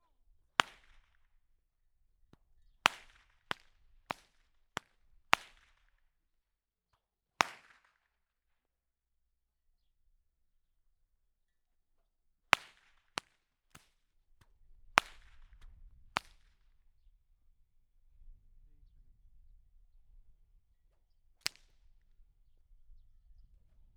pattintás, karikás ostor; Lászitanya

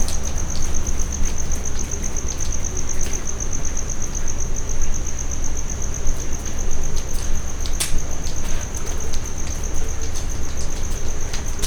műanyag elosztódobozban jobbra mocsári macska, tücsök; jaszberenyi zoo 2016

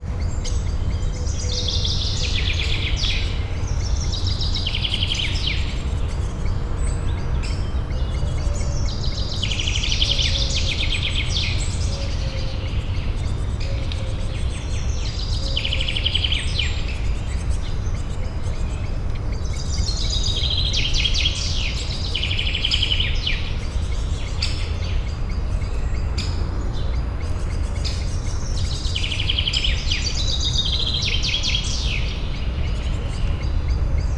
hajnali hangulat hétköznap, vadaspark; szegedi zoo 2011

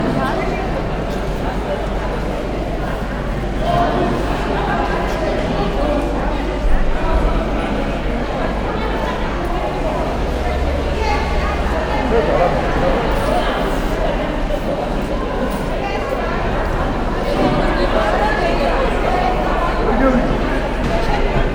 zsongas a piacon

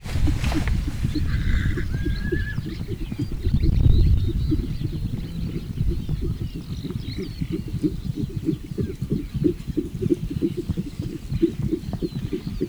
egynapos csikóját védő kanca vadló rezervátum; Hortobágyi Nemzeti Park

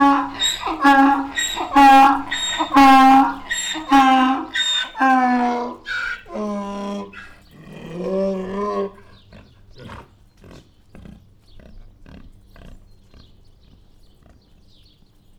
beszéd közben, magyar parlagi szamár; debreceni zoo 2012; marantz puska

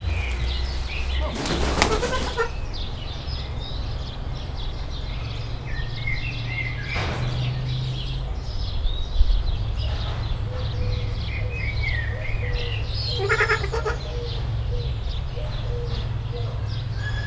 trombitás szarvascsőrű, ezüstarcú trombitás szarvascsőrű; állathangok; nyíregyházi zoo 2010

